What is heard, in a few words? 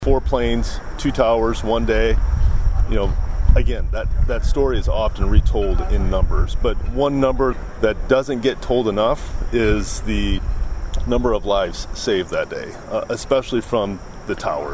SIREN; sioux city fire rescue pays tribute to 9 11 victims